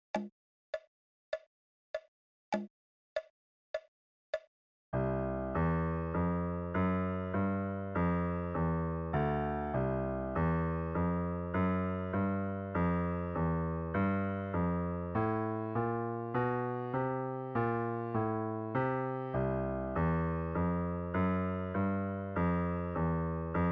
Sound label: Blues left